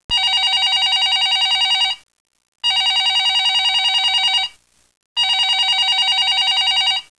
teldial

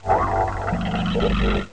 robotic; avenger

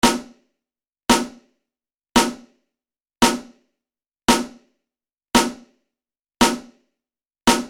Riff

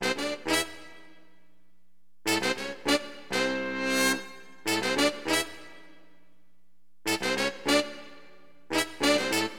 FNK HORN, Acid Jazz Funk, Wind; LiveAct Pool

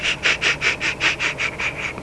snicker; People; Sound Library 2000; Wayzata Technology

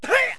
ohei; Interactive Media Design Review 1999

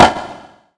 shot; VirtuaTennis

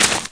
dirt, footstep

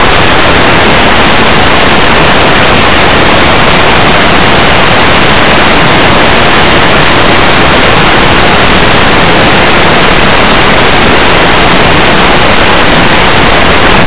water; Blue Heat

